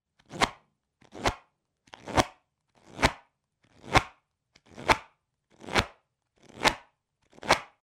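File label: sound of playing cards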